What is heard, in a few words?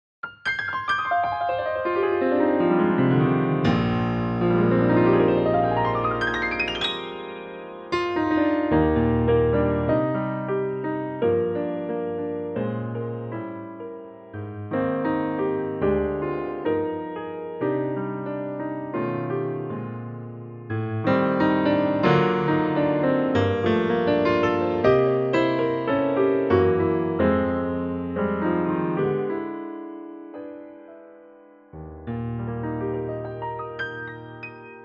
Close